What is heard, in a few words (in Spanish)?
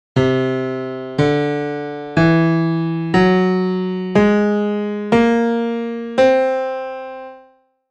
Escala Tonos enteros